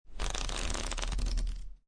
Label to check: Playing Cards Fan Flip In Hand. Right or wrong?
Right